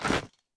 movement step; smite; odin

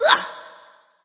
grunt hit; smite; voicepack; skadi

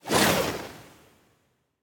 umbrella throw fire; smite; vamana

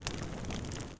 campfire; Smite; Sol

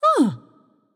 nv surprised; smite; voicepack; morgan le fay

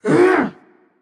Grumble; smite; merlin

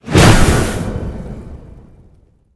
Dash; smite; god susano

